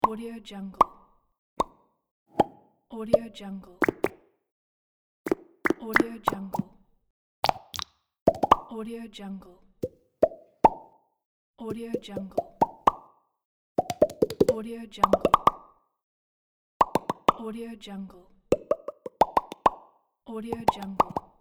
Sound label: pop pops popping pack